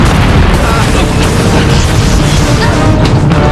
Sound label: mine explode; Trek